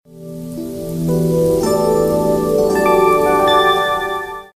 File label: gentle rain sms